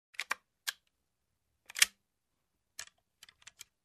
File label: Pistol reloading sound